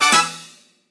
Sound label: Coin Rush Win; New Super Mario Bros. 2